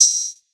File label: Hi-Hat Open, Cymbals; drums